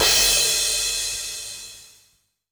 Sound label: Ride Finish